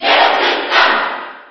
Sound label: Cheer JP; Zero Suit Samus; SSB4